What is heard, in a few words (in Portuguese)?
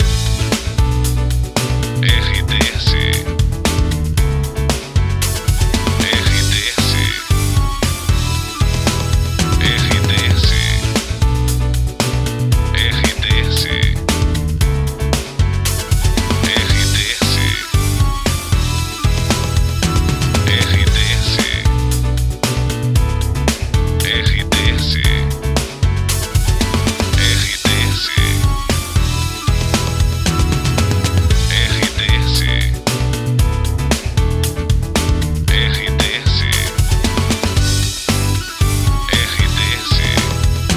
trilha para locução; Prata FM 2021